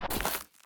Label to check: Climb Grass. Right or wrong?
Right